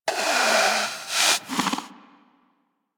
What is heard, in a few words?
Predator Snore; Warframe; Hunting Critters